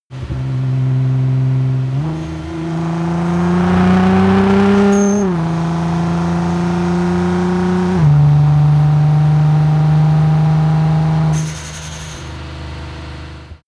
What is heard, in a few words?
car nissan gt-r 35 acceleration fast